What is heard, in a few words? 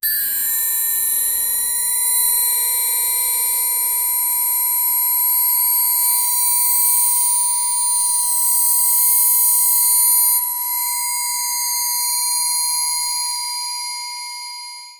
musical triang